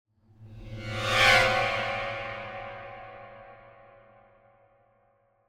Symphonic Hit Run Across - Increasing Violins Chord; Hollywood Edge; sound designer's toolkit v2